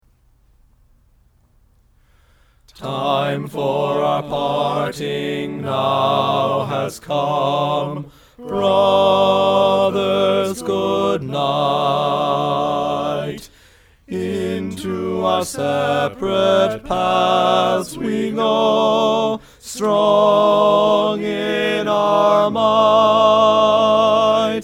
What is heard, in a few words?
Parting Song, Bari loud; Sinfonia